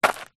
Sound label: footstep single dirt; foley; zapsplat